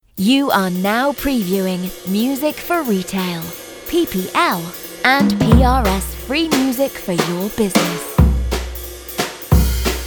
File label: jazz